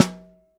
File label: SN1 Top, Snare; Ableton Foundation FreePacks; Snare Designer